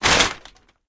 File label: deleted take; replay